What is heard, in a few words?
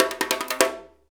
PRC HAND, Africa, Perc Loops